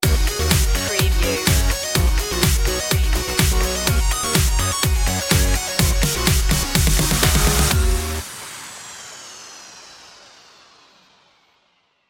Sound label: pop till we drop stinger